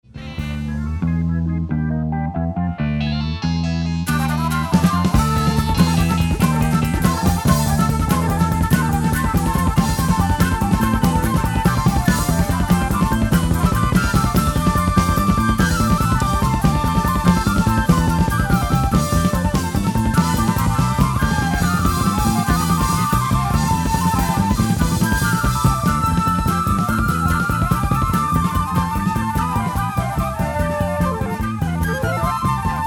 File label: Improvisation passage